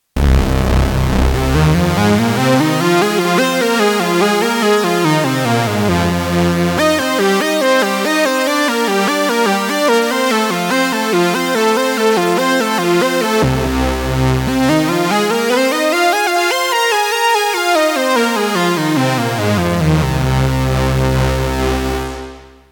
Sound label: Lead Wa Wa; micromonsta2